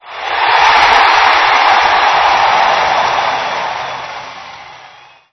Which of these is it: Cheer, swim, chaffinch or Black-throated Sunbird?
Cheer